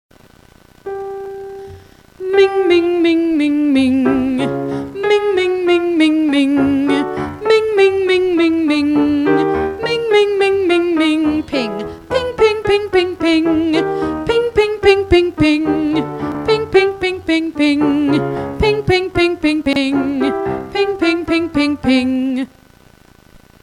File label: Warmup, Vocalization